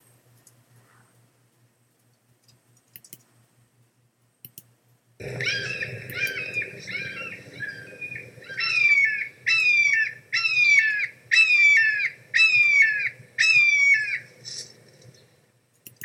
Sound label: red shouldered hawk